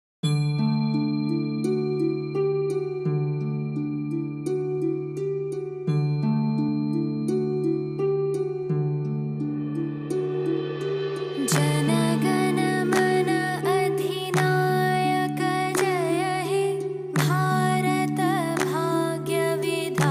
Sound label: India National Anthem; Jana Gana Mana